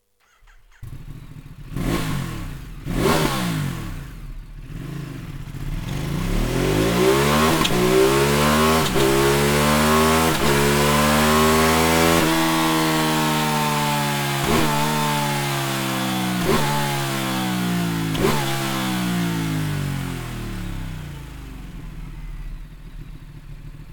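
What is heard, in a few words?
slip on, exhaust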